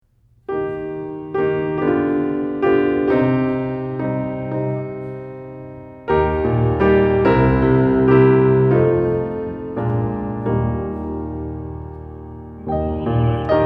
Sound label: Piano; My Hearts Prayer